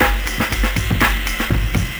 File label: sn, breakcore breaks